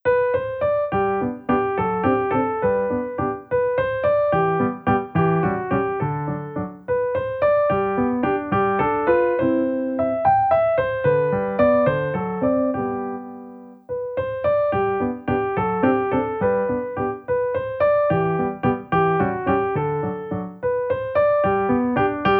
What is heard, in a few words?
Sonatina in G Major – Romance, piano; beethoven